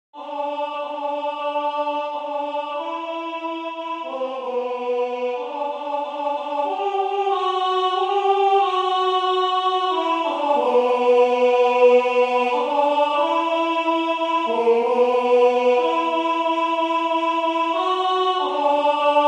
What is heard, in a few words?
Alto; GOSPEL TRAIN